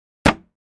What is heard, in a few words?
Gallon Jug Hit Hammer, plastic